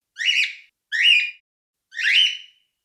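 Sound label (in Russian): одомашненный попугай птичий крик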